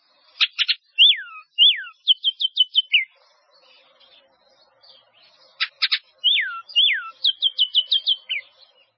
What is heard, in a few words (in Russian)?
penie v peremeshku s krikom ivolgi